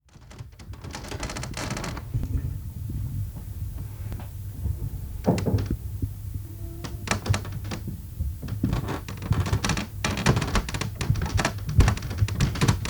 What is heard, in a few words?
Squeaking Floor